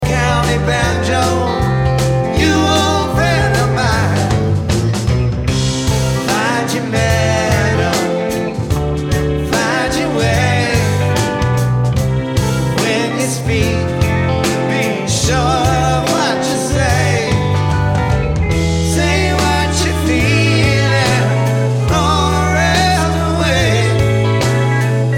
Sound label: Banjo